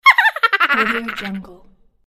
goblin laughing

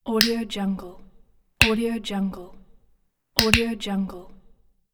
clicks; josepharaoh99

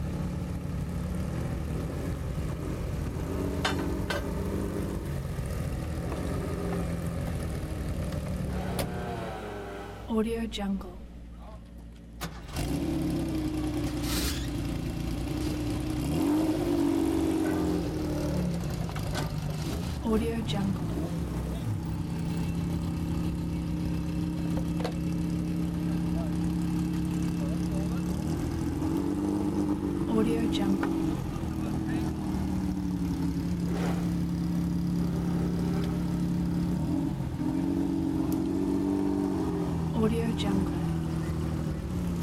ambiance car rally regrouping stop loop

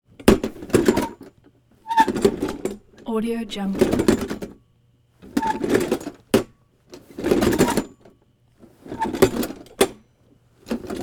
metal wagon red radio flyer style towels; prosoundfx

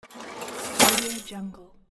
cash

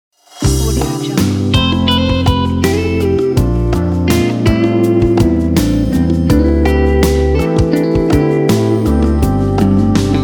jazz logo; serjilio

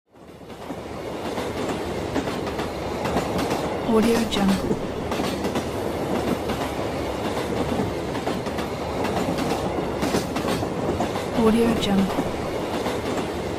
train

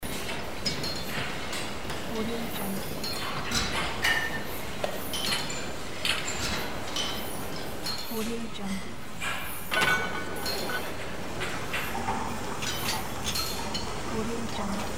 fitness ambience

